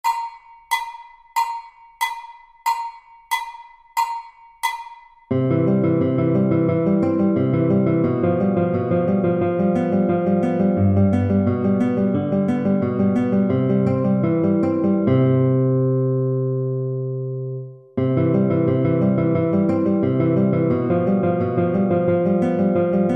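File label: bubbles accompaniment, guitar; B string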